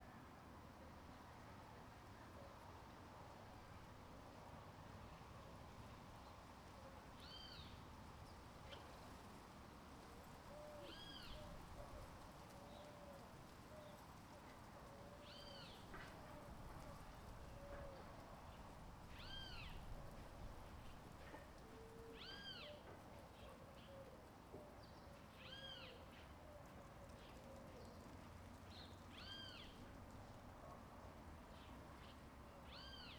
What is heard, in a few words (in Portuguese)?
Vento suave nas folhas correndo pelo chao residencial com passaros